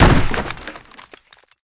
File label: Fridge Fall; Game rip; MiSide